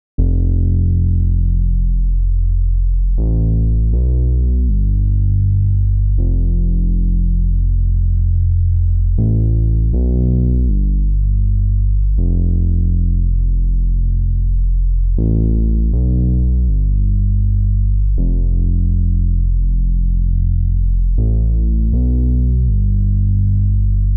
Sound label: 80 BPM C Maj Bass; Loop Stems; Cymatics; People Change